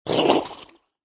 Horse Snort, Horse snorts

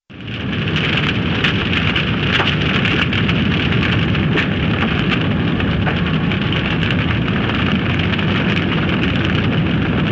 Large Wood Fire; Big fire roar and crackle with wind whistling through; Furnaces; Tom Hutchings

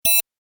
Tech beep, Beep, High Tech; Tech Sound Effects; Tech (Sound Effects:Science Fiction)

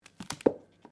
Book Open; Book movement; Books; Offices; SoundEffects-Foundation1

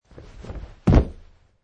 Landing; Man wearing jeans and hiking shoes jumping and landing on carpet surface - variation 2; Wrestling and Struggling; Michael Huang

